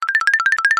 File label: cartoon run light footed fast wooden; zapsplat